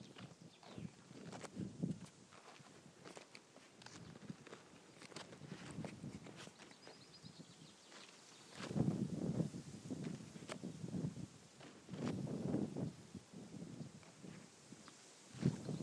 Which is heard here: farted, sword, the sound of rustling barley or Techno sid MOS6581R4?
the sound of rustling barley